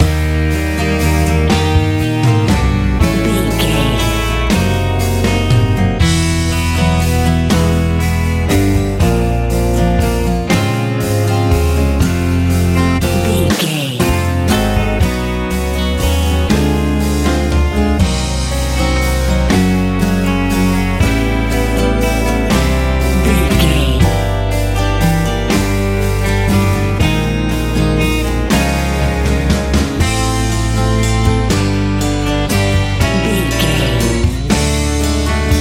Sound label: lite pop worship